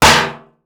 Metal Strike

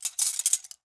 Silverware Movement, Kitchen

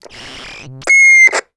Walkie Talkie Interference

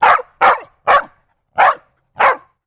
Dog Barking; Animals; 1001 Sound Effects

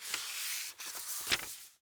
Book Page Turn; Office Supplies

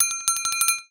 Bell, Metal

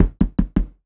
Door Knock Muffled; Doors & Drawers

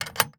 Dresser Drawer Handle; Doors & Drawers

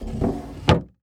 Dresser Drawer Close; Doors & Drawers

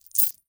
Coins Shake in Hand

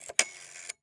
Telephone Rotary Dial; Communications

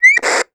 Walkie Talkie Feedback; Communications

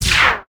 Space Gun, Sci Fi

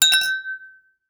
Glasses Hit and Ring, Crashes

